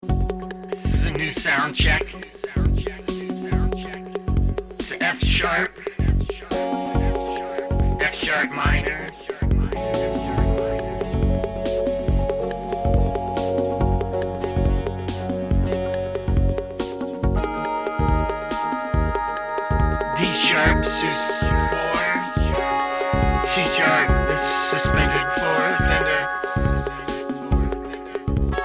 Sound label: Soundcheck